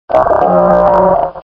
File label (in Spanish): Grito de Wailmer